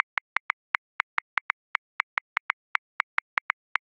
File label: Kuduro Shuffled 16ths 142 bpm; Grooves; Ableton Live 12 Lite; Core Library